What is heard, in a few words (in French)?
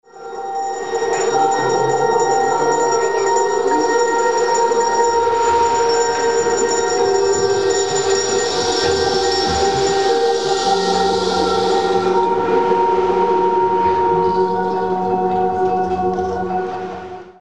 ambiance